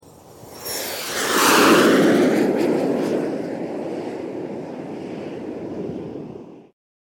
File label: jet flyby